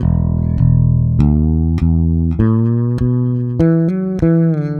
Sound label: Fretless Electric Example Riff